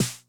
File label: Snare Accent Low Tuning High Tone Mid Snap, 909; classic machines